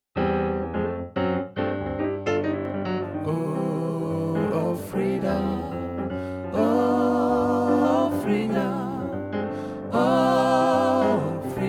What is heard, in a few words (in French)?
Hommes, Gospel; Oh Freedom